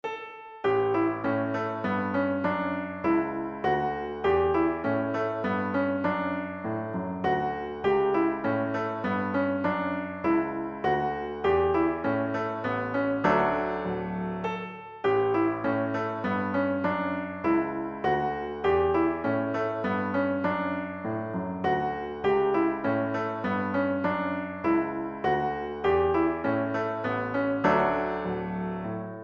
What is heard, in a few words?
Minuet and Trio in D Major, piano; Mozart; No.7 K176